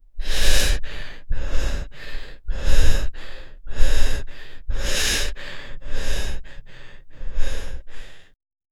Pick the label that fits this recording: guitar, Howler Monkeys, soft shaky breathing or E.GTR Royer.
soft shaky breathing